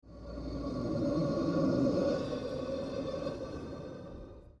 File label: dementor